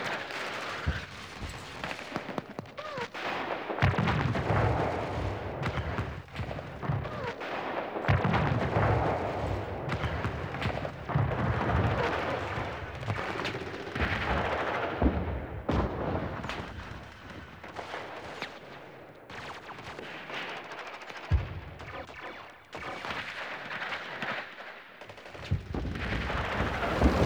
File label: Shotgun Ambience; Cinematics; Grineer Eidolon Shotgun